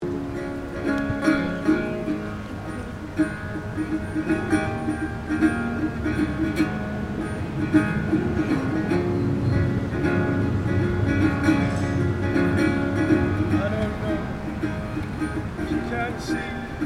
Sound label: Ambience Melbourne Busker Guitar Singing; Melbourne Buskers; Concert Sound Effects; Concerts (Sound Effects:Backgrounds); Foundation1